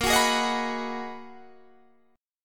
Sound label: Ab Minor 7th; Abm7/Bb; strummed